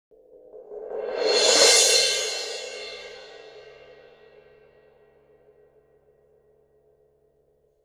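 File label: suspended cymbal roll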